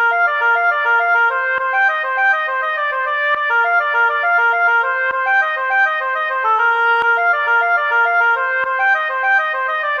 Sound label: Jig